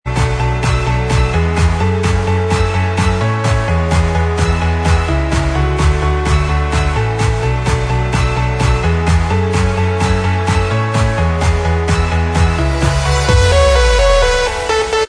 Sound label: Inst